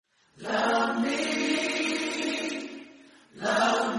love me instrumental

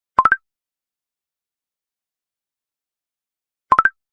snapchat

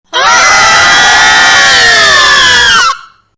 screaming

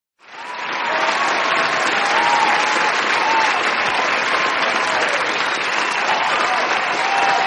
claps with cheers audience

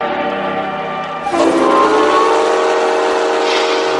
big one alt whistle

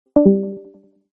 call leave; discord

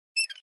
squeaky metal door

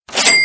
ka ching

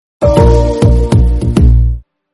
gong

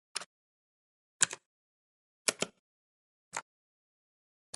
random button

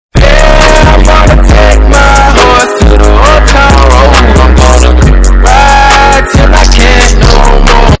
bass boosted

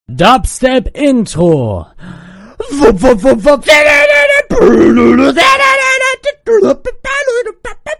dubstep intro dupstep parody

